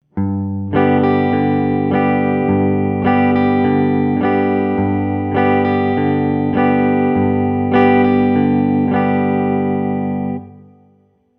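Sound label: Basic Right Hand Strum Pattern, guitar; introductory guitar skills worksheets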